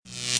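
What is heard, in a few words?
light Impact; merge-dragons-2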